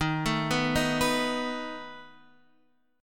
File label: A Suspended 2nd Flat 5th Eb, guitar